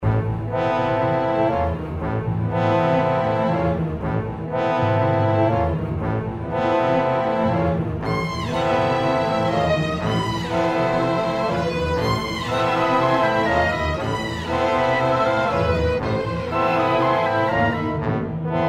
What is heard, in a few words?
orc horror